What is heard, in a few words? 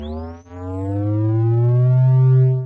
health regen; halo